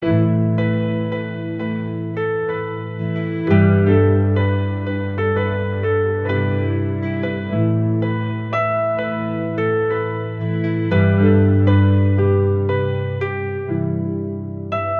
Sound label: start with a soft acousti, instrumental